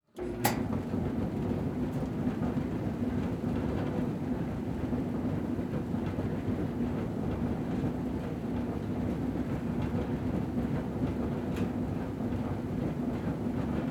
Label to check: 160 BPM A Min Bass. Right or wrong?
Wrong